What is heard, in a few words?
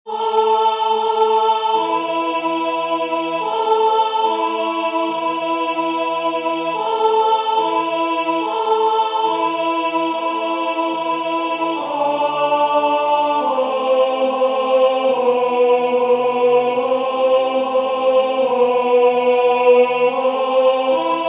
alto